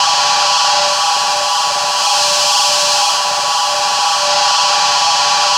crankshaft, amb. noise; Spectrasonic Distorted Reality 1

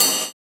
Cymbal, jungle breaks drum sounds; ORIGINAL JUNGLE BREAKS

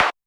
perc, Drum Machines, Clavia Nord-Drum; 300 Drum Machines

